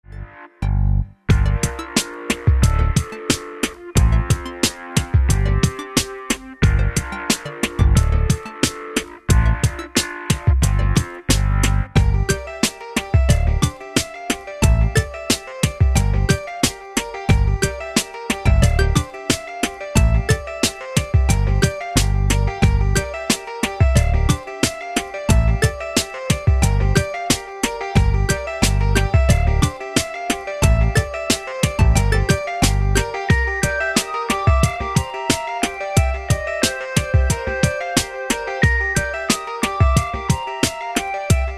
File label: hiphop